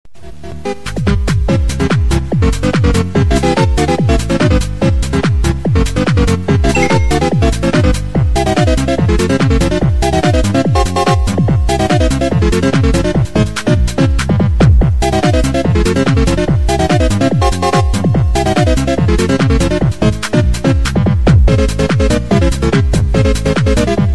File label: mario remix